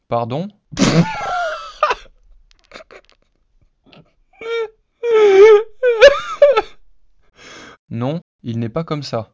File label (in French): rire synthese basique